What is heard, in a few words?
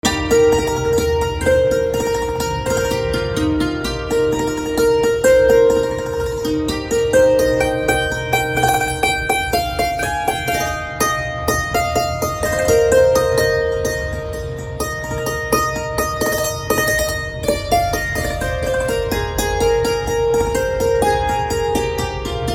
Santoor Instrumental